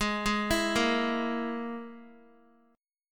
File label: G sharp Suspended 2nd Sharp 5th, Guitar; arpeggiated